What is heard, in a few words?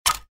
button